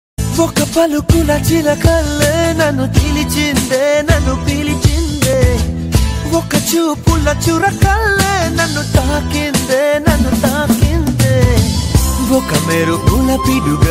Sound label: telugu love song